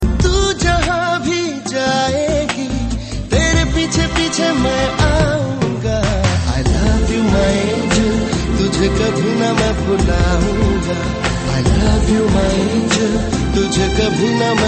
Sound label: Bollywood romantik